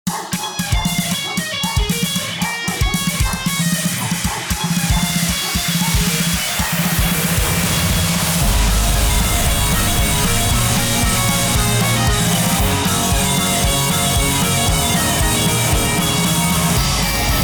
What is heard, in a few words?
dog song rock version